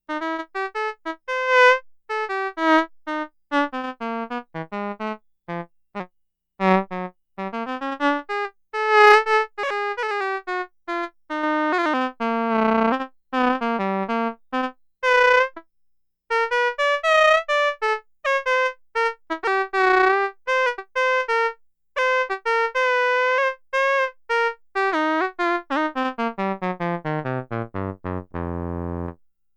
Trumpet, Roland SH-2000

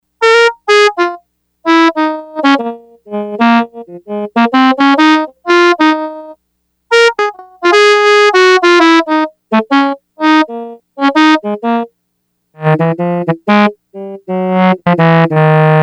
BC1 Sax, Yamaha DX100; Factory Patches

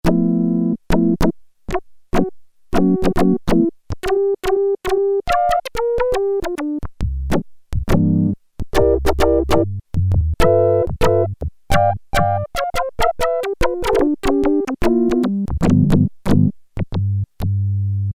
Spitting Organ, Prophet-5; Sequential Circuits Prophet-5 (Ver.3); Factory Patch; File 2 Programs

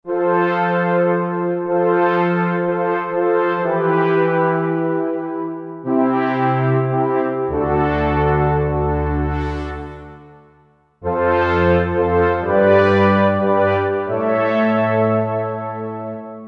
Horn Swell, Roland JV-2080

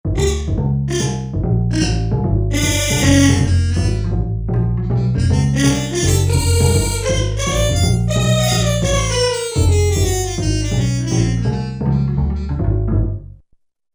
Bass MT grw, Korg MSC-1S; Korg MSC-1S Variety

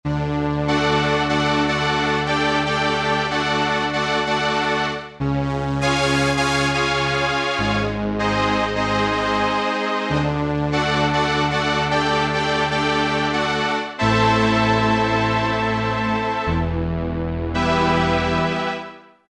STR, vintage synth; Roland SR-JV80-04; Rhapsody STR

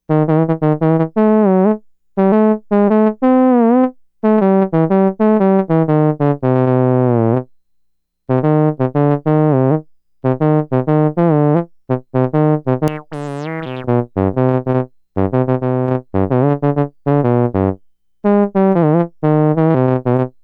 Trombone, Roland SH-2000

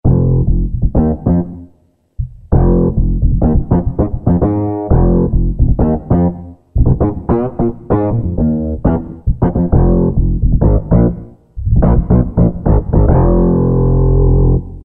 BA Rox, Yamaha TG77; Factory Presets

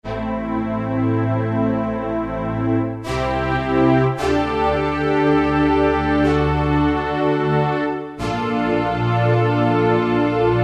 Pressure Me Strings, Roland D-50; Factory preset demos

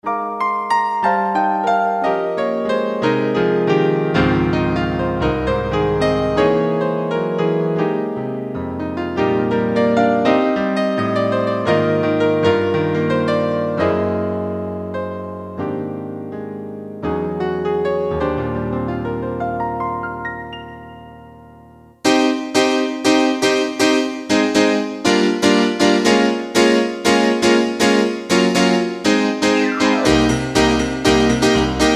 Piano Reed, Roland EM-10

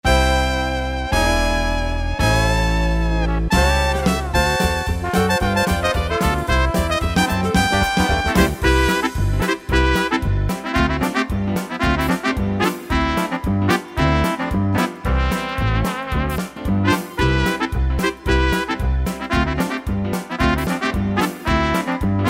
dixieland, Yamaha PSR-300 styles; Factory Styles

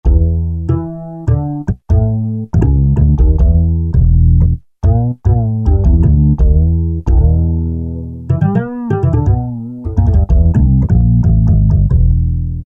Up Right, E-mu Morpheus

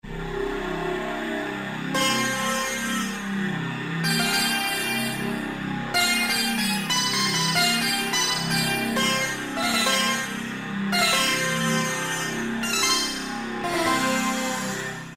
Indian jam, Korg N364; Factory Combi demos

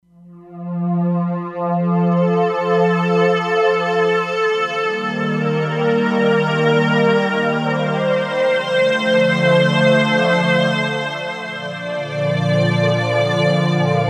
Mizmarized, E-mu Proteus-3; Factory patches

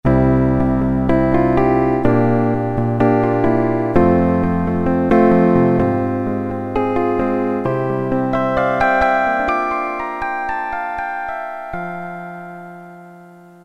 Acou Piano, Roland MT-32

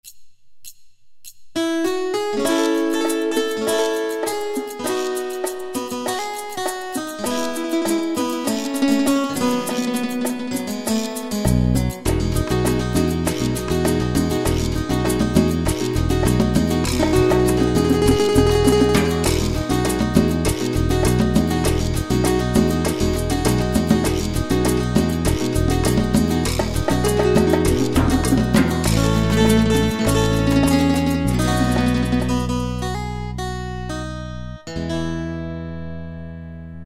Son, Yamaha QY70 Preset Styles

